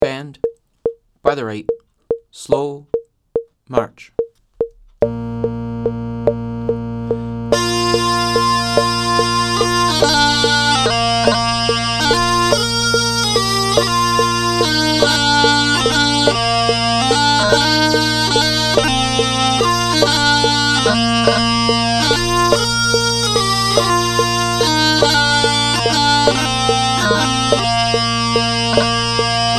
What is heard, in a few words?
pipe section, 48 bpm; My Home